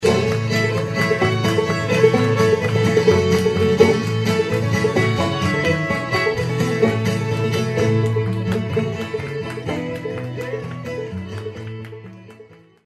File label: Music